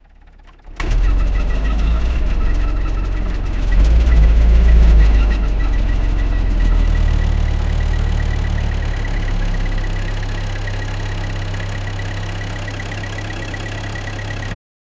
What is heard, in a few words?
old volkswagen bus engine